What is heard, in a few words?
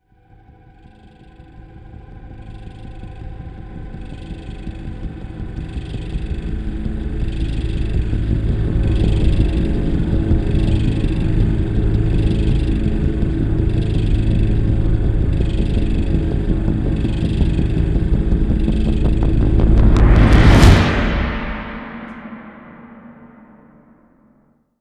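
sting, synth to reverse drum; transformers war for cybertron gamerip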